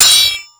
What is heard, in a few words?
SW HIT, MORESWORD; Total Control - Action Cutz